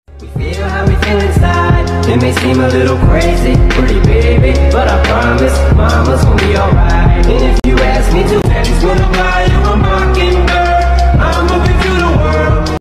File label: trap remix